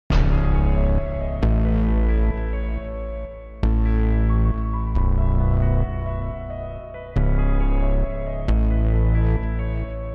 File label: instrumental ringtone